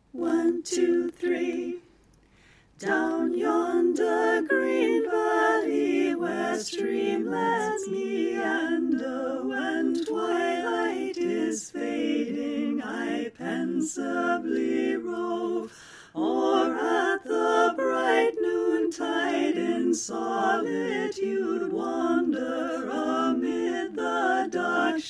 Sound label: tenor panned R